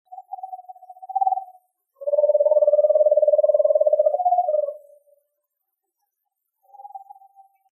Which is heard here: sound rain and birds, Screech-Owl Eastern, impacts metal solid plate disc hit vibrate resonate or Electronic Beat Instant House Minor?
Screech-Owl Eastern